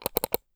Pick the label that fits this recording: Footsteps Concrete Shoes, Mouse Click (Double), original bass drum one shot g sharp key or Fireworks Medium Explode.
Mouse Click (Double)